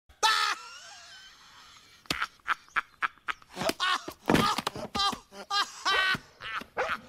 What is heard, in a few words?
laughs; woody